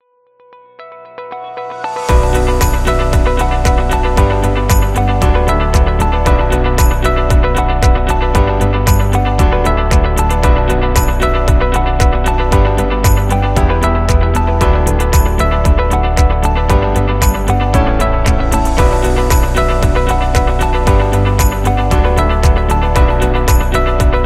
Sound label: Optimistic Corporate Background